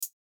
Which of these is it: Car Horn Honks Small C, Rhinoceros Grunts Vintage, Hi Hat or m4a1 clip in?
Hi Hat